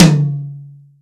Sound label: high floor tom sound c sharp key